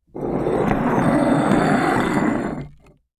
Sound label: Sand Pebbles; TOOLKIT vol.2